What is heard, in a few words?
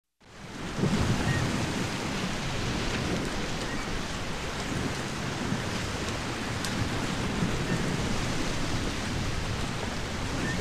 Sounds of the surf ocean light waves seagulls; Звуки прибоя океан лёгкие волны с чайками